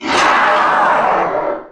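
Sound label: death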